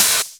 Open HH, Cymbals

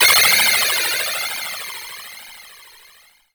SPLASH; Best Service Dream Experience; SF+EFFECTS

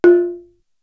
gamelan, Korg DSS-1, f3

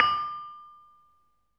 LAMELS D5, Asian Traditions; USB Soundscan vol.60; AKAI; LAMELOFO

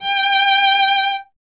viola, solo instruments

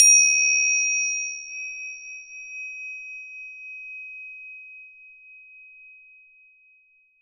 Tingsha; Polyend; Simulacrum Sounds; 1 Shots; Acoustic and Electric; Drums

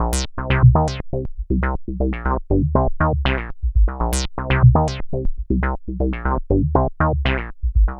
Bassline, Prototechno

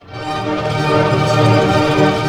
ARP A MAJ PP, STR ARP; Sound On Sound; Peter Siedleczek's Orchestra

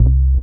Single Acoustic Basses

